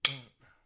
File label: Drum Stick Tap